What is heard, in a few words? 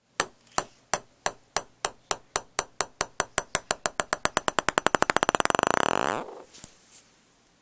pingpong